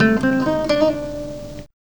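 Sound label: guitar riff; E-Lab; X Static Goldmine 1; akai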